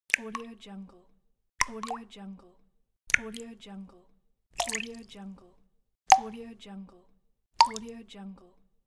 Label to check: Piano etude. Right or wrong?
Wrong